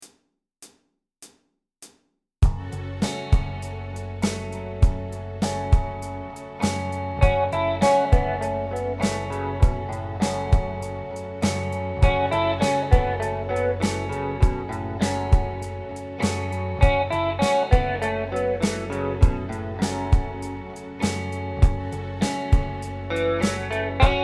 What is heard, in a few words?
Fm Double Stop Exercise Lick